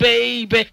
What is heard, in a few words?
"baby" SUNG; Voice; ooh ahh